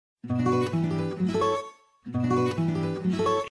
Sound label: New Business Tone